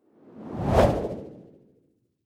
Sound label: whoosh impact soft wobble high